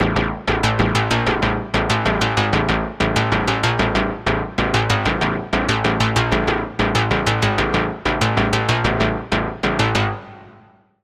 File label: Pluck; Routed Sound; Spire Expansion - Doom Carpenter Vol.1